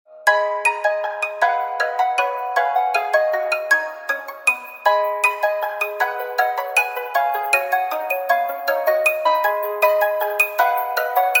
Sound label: new nice music; rnb